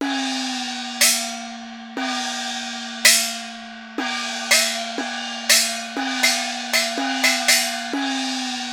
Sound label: PERCS 120BPM; INIS - Opium